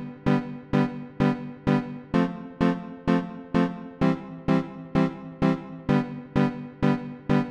stem chords, music loop; vellum

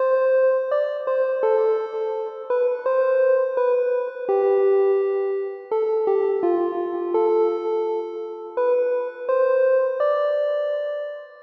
stem lead, music loop; timber

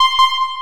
alert; anvil

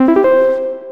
powerup, game sfx; rust

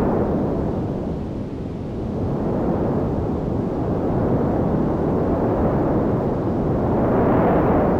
wind, ambience; rust

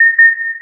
alert; prism

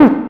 ui back; rust

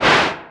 whoosh; vellum

